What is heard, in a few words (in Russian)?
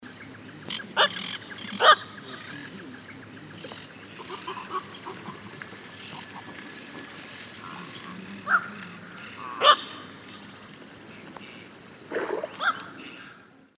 kvakva golos